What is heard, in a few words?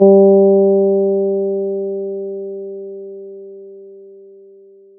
guitar